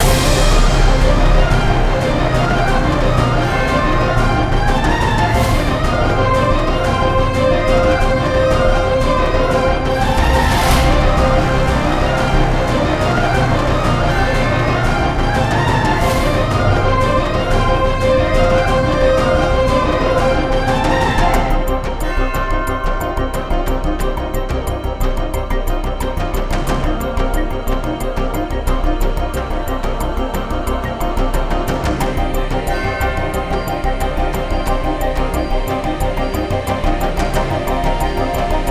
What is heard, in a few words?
Loop; Lost Epic